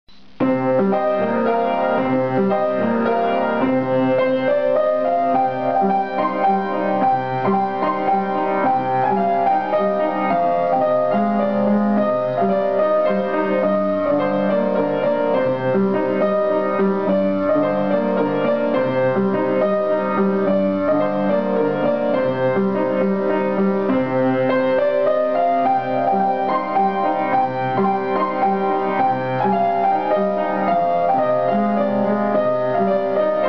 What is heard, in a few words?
Piano